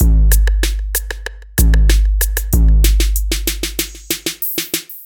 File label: Hard Rap Loop